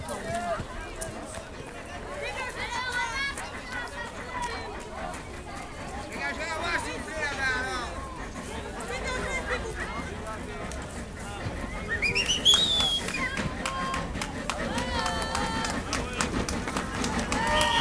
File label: steamengine original